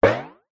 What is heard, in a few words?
cartoon metal hit impact ascending tail